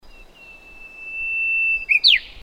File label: Brownish flanked Bush Warbler, birdcall